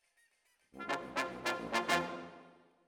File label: Brass Over